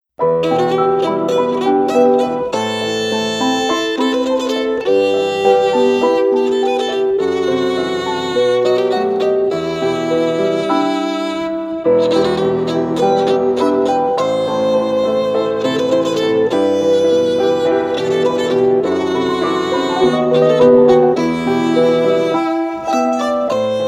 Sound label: Classical Piano and Strings